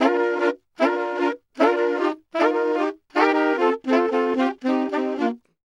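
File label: Sax Section 076bpm Bb, Saxaphone; Quazibeat; African Secret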